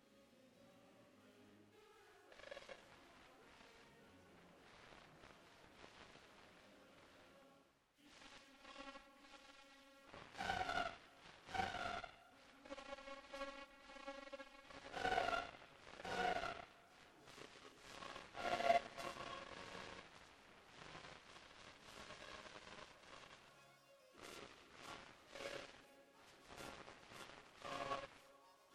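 example distortion, This track uses the Baxandall-Walker difference technique to isolate the distortion introduced in the previous track.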